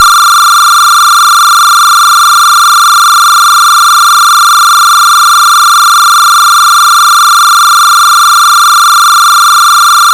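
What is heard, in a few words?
Alternating, combined signal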